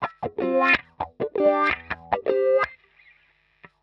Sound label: 125 wah 3 chords D E A, wahwah; PowerFX - Nu Jazz House